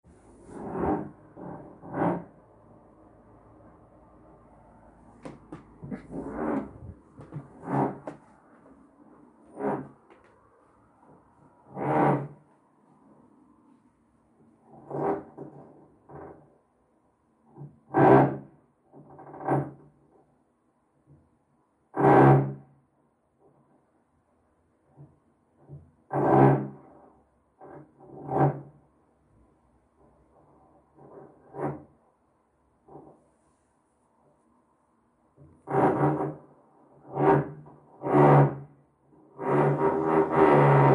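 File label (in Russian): renovation in the neighbouring apartment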